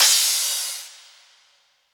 Crash One Shot

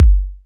Kick F-

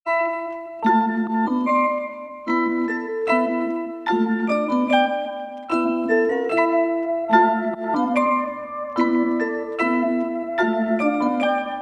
melodic loop 148 Emin; bigshot

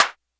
Clap Snare

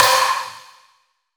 lead one shot trap stab C

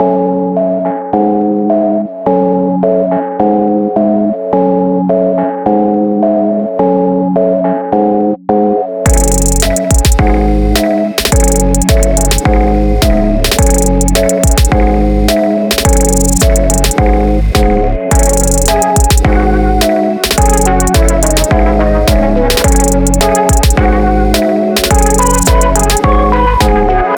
106, kit loop bounce full mix, C min

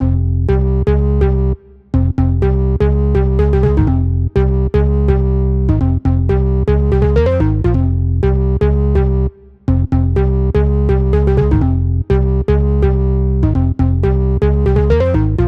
bass Fm 124; festival

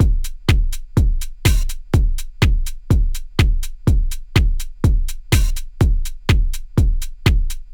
Full Drum Loop

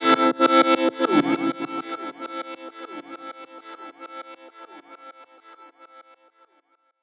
Am Electric Guitar FX

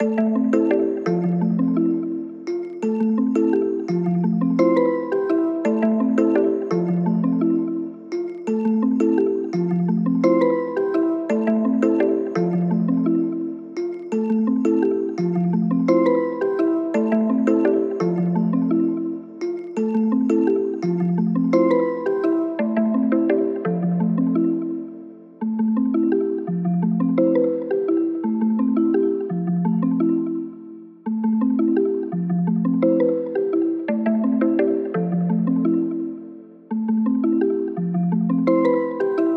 170 BPM Am; Tropics

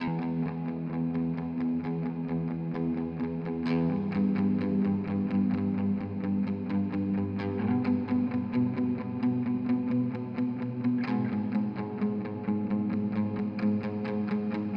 130 A min Guitar verse

